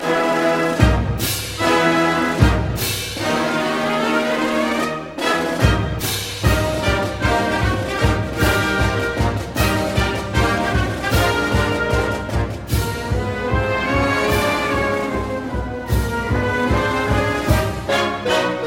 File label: Fight Song; Ucla